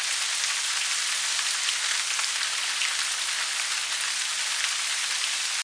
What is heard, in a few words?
Sprinkler; In the Line of Duty Firefighter